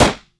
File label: 9mm, gun shots; 1000fx